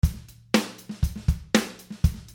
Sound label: pop v 120 b; Drumloops